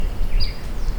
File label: hammonds flycatcher, birds